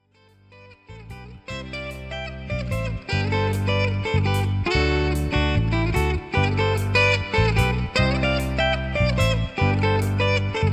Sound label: Guitarist